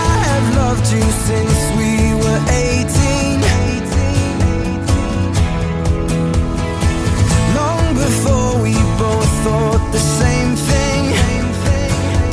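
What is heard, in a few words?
new english guitar music ringtone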